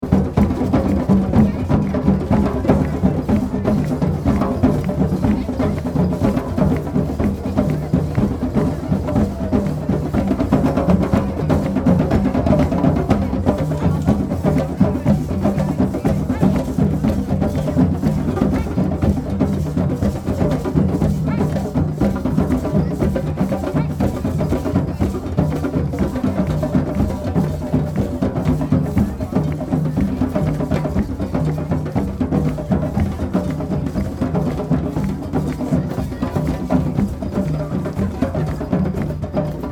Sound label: drum circle